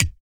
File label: VOC PERC, Vocal Planet Jazz FX BASS PERC